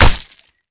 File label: bullet glass, weapons impact; Call of Duty Modern Warfare